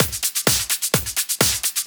Clubhouse Loop 128 BPM; Best Service; House Definition VOL-1

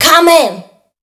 PHRASE, Voice Spectral

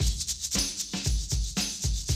Classic Drum Loops

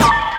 JNGL HIT, Synth FX Misc

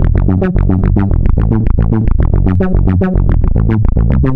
Bass Line; Eclectic Electronica

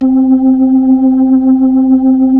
B-3

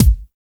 POP KICK; Drums In Your FACE!; BONUSKIK

